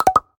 Bubble Appears; Angry Birds

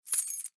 Take Key; don t be afraid the first toy

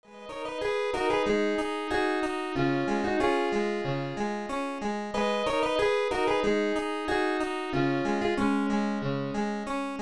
Harpischord; Ultima VI; SNES

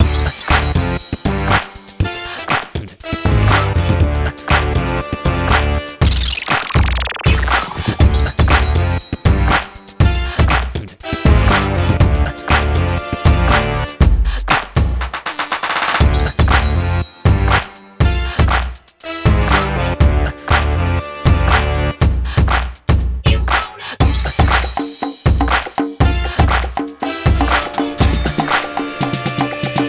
Mall Track No Vocals

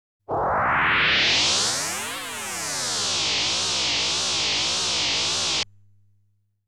Sound of Gathering Breath

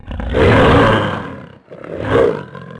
mountain lion

